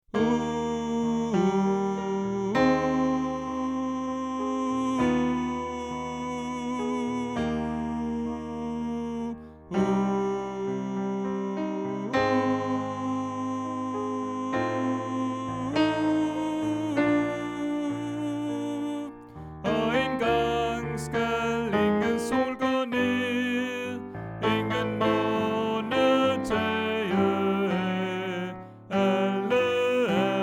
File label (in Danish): Tenor